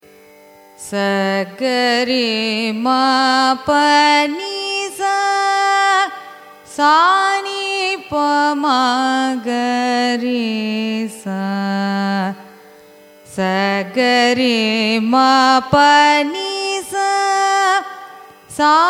raga nalinakanthi arohanam avarohanam; carnatic music

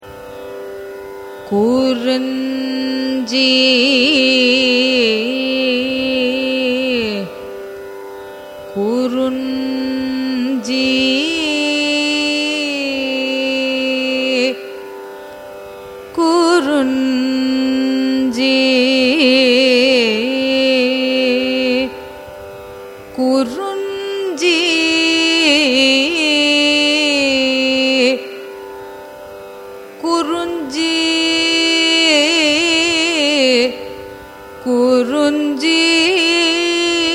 raga kurinji signature, carnatic music

